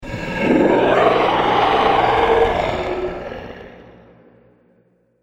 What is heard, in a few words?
Cruel Dinosaur; Dinosaur Sound Effects; animal